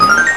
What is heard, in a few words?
coin appear; GAMECUBE; SUPERMARIOSUNSHINE